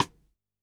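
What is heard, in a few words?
Snare Stick, drum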